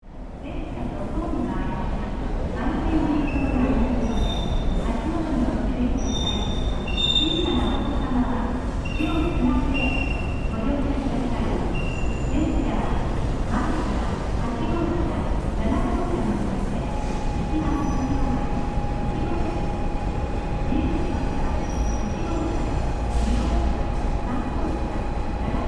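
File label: bullet trains station; transfer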